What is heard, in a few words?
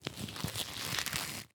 Latex Glove; Blastwave